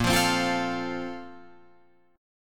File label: A sharp Major 7th Suspended 2nd, Guitar, Open F; A#M7sus2; strummed; JGuitar